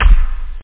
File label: dice stop